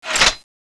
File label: launcher shoot, weapons; mgsm